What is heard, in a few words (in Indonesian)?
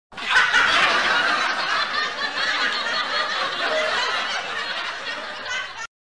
efek sound ketawa; bajaj bajuri